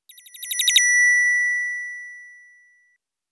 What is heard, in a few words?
rising up, wake-up tones